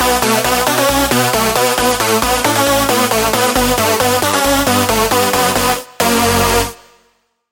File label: Lead; Digit Slider; Routed Sound; Spire Expansion - Hyper Gamer Vol.1